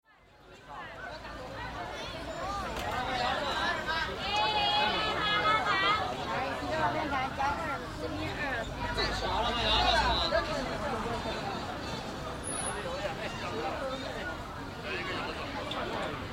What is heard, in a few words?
night market